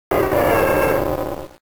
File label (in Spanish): Grito de Combusken